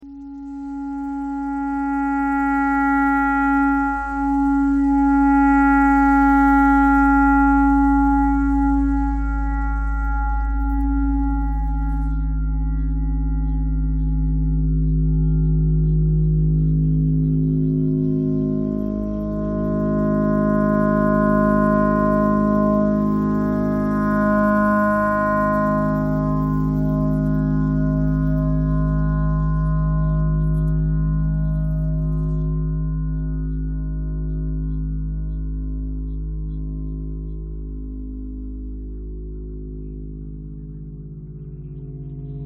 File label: clarinet clarinet simple